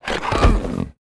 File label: deser klan war die